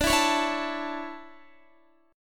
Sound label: D Major 7th Suspended 2nd, Guitar; strummed; JGuitar